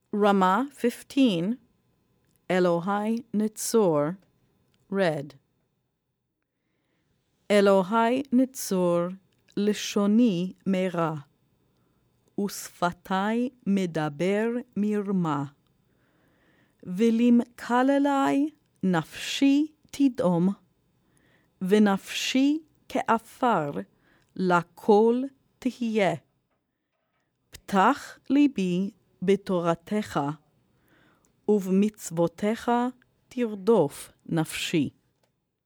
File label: elohai ntzor chant